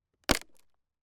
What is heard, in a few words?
impact wood panel snap